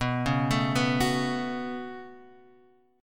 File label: Db 7th Suspended 4th Sharp 5th B